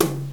r tom

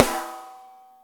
snare

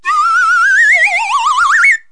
Warble Slide Whistle Up; Wild and Wacky Sound Software; 1,000 Sounds; Music; Comedy Accents

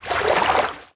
stroke; MOTS

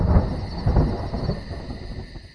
Lightning Start Windows; Christian Desktops for Win98

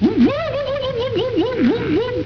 whoop

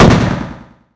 shoot; PC Gamer IT CD 48; Big bang

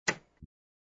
tv click

